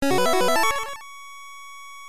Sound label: Success Jingle; pop'n music GB